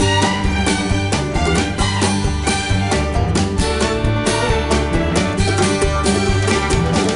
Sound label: Pause Music; cars mater national championship 2007 xbox 360 gamerip